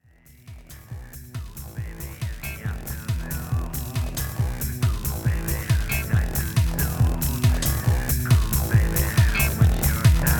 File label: Jazzbox Fade